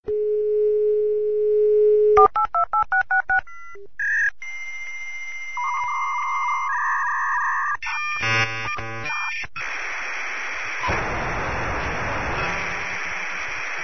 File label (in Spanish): Conexion Modem